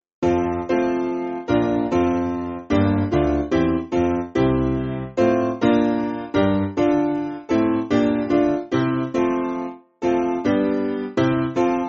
Macht Hoch, Piano

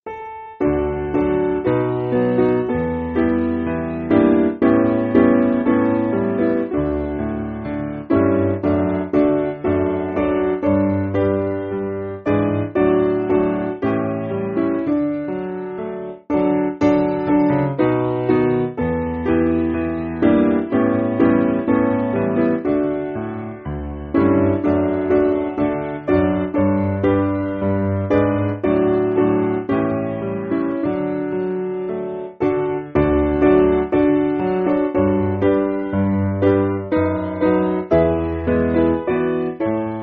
Lord May Thy Blood Piano

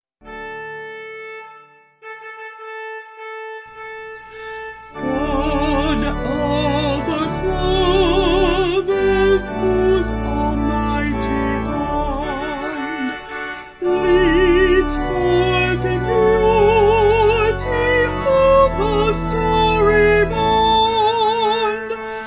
Vocal Organ

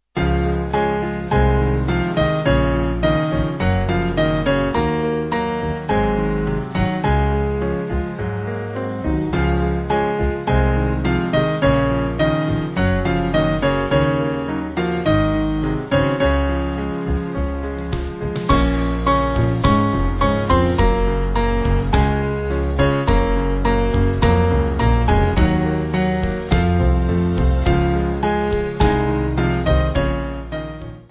Band

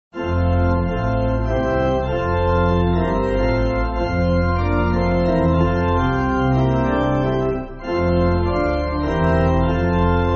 Pipe, church music; Lord Full Of Mercy; Andrian

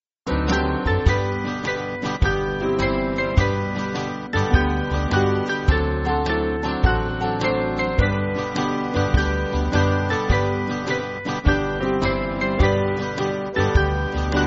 Band, church music

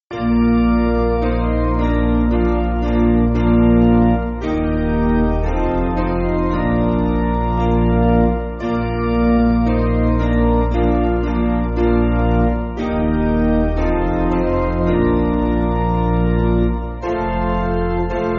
Piano, church music

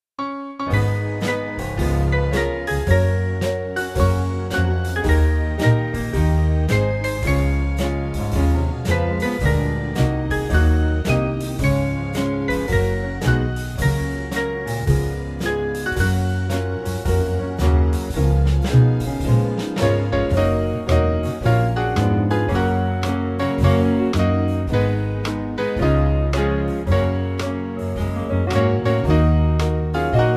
Band; In The Little Village; Neidlinger

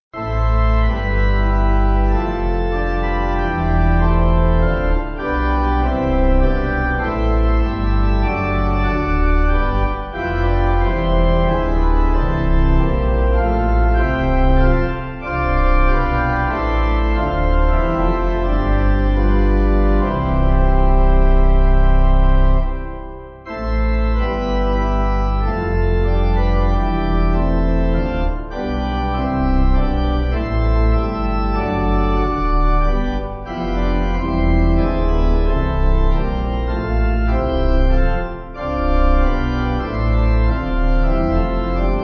Strengthen For Service, Pipe

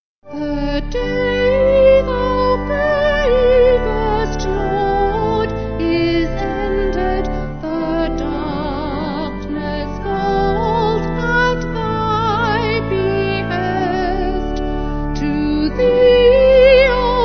Vocal Organ; The Day Thou Gavest; St Clement

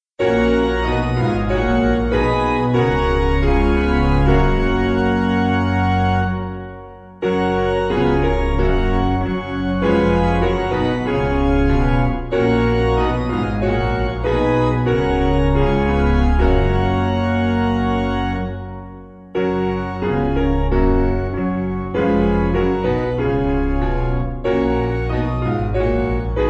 Piano

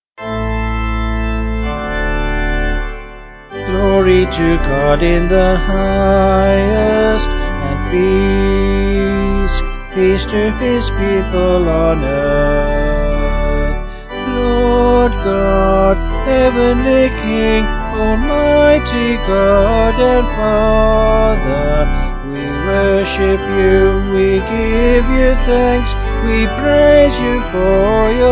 Gloria Vocal Organ

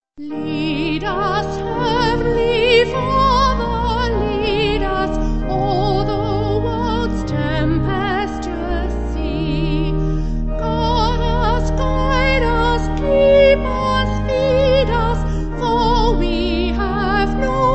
Vocal Organ; Mannheim

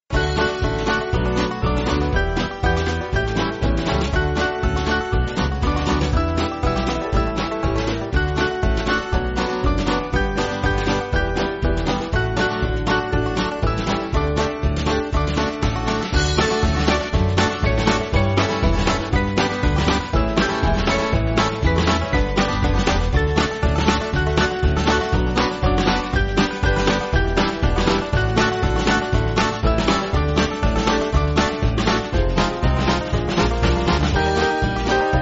Banjo Band; Oh How Good; Oh Que Bueno

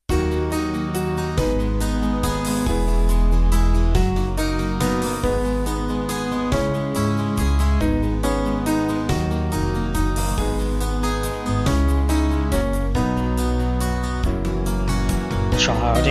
Vocals Band

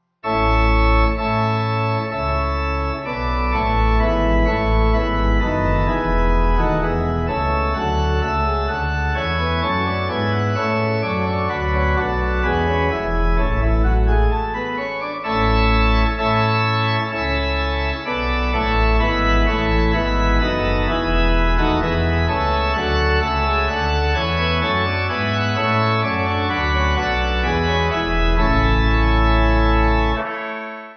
Rigaudon Pipe; Campra

